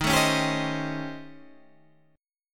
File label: A 7th Sharp 9th Flat 5th